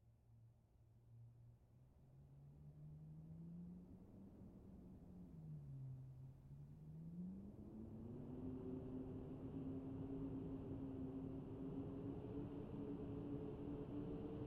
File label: Random Ambience; Ellie's PC